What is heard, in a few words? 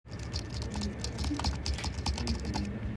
typing